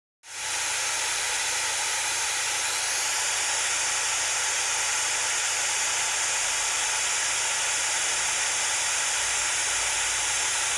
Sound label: White Noise Sample